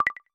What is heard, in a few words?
Unlock, phone ui